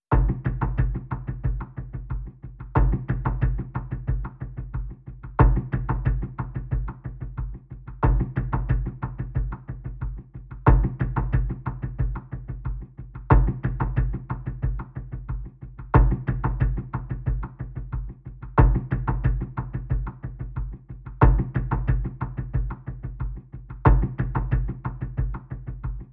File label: Perc Only